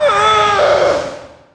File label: iceq hit; DAoC